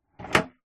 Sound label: plastic file tray drawer open; office